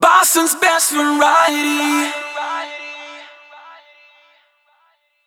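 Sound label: 104bpm MIX, jingle; WBMX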